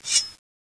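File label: crowbar deploy, weapons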